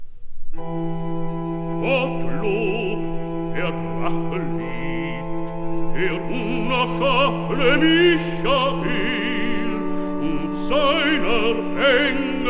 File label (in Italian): recitativ; bach; bwv19